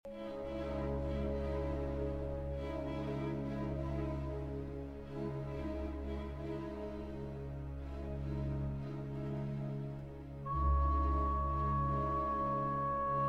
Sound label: Trumpet Concerto in A-Flat Major Meno Mosso